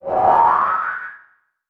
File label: Multimedia Internet CD-Rom Flash Whoosh